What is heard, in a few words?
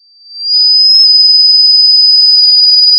pop, sound of a tea kettle whistling